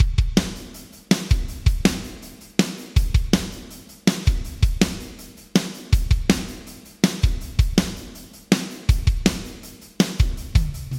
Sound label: Drums Yamaha ProR3 Medium Hall Vintage Digital